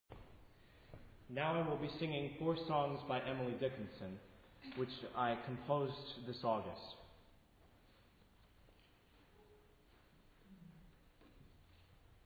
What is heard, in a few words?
intro baritone